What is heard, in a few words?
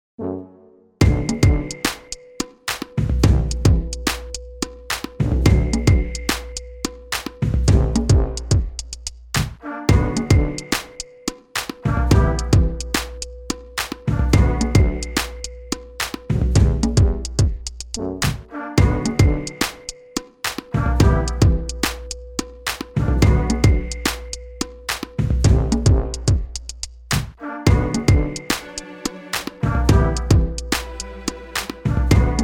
Brass Bed; GouldEffect; beats